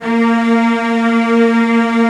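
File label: CELLOS C4, Strings; ILIO; Synclavier Strings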